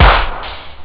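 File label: snare drum; ST-XX Amiga Soundtracker Sample Packs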